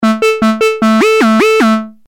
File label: legato; nord